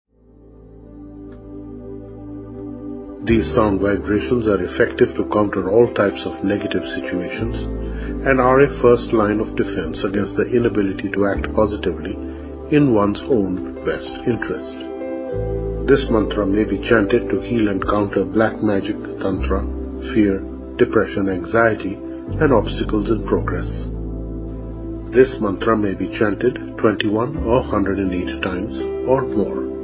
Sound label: anti tantra mantra; kali chidi; announce